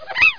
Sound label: hohoho